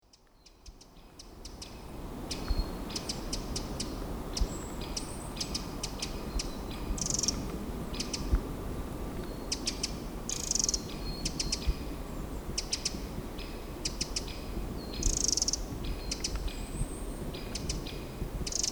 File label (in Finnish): surina; linnut